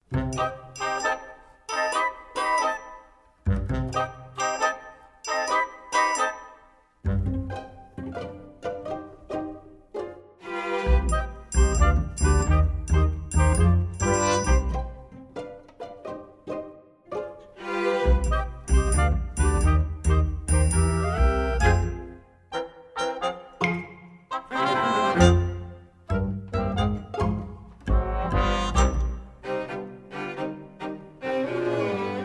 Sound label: INSTRUMENTAL